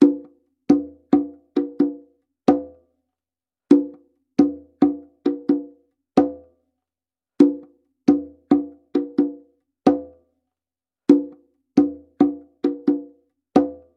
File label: Low Conga 130 BPM; percussion loops